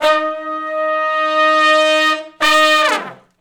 Long Note Swell & Falloff (Eb) unison, Horncraft for R&B; Sections; Crimson Blue and Fabulous Horncraft 4 RnB